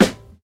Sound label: solid steel snare drum g key, snare single hit tuned to the G note; snare drum samples